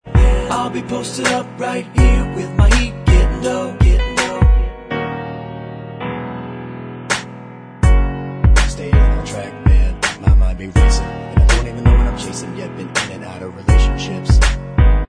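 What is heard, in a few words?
backing vocals track, backing tracks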